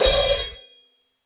crash choke louder

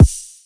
exhale kick; Kicks; single hits

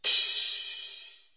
filt crash

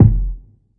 kick ouchy low; Kicks; single hits

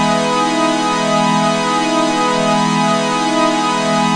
mks pad; Pads

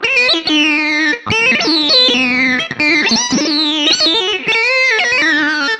even more nonsensical, Guitar Noizes

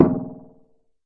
Processed Ethn Perc, ETHNIC and WORLD PERCUSSION; Doru Malaia Percussion

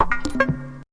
electrobop, fills and rolls

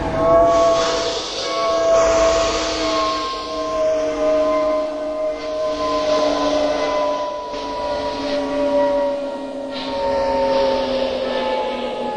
steam decay, Factory Percussion

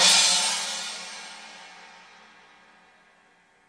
stereo L china

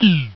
Geeuu, Cheesy Lo-Fi Sound Effects

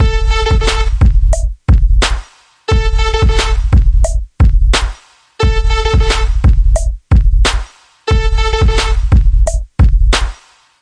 088 deep kicker; 081 to 090 bpm

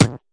tight hit, Tight n Hard Kit; STYLE KITS (Hip Hop, Etc)

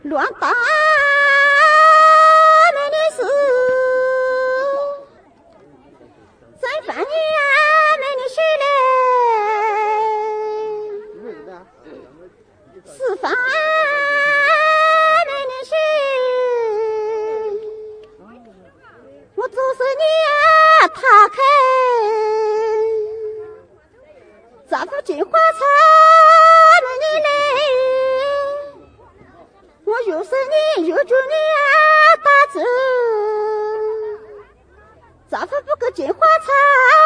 miao female vocal sung long, Miao Love Songs

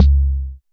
Jomox MBrane, drum machines; Jomox MBrane 11

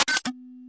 Virus crunk n squeak, Electro Hits

